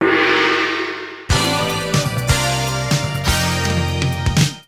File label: BGM Level